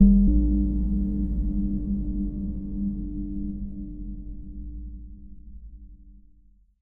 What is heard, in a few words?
editor blips; Source Engine; Portal 2